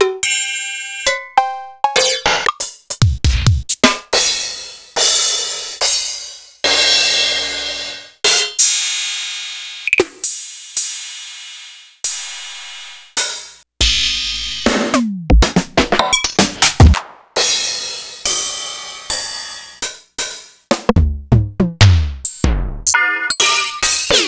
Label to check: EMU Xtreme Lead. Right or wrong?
Right